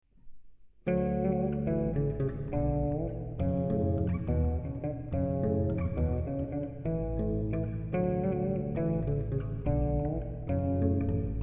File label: chord bass